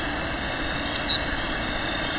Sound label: Bicknell's Thrush; ETNA NY